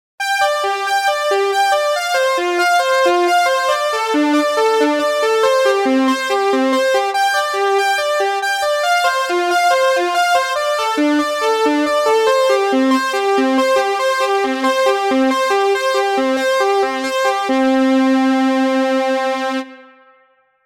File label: String